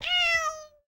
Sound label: meow long